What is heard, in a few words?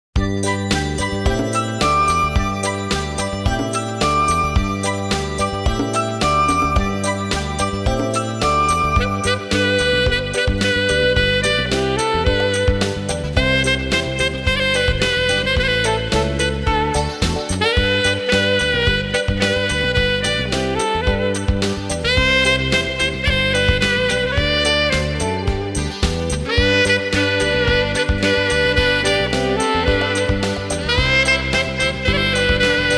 jingle bells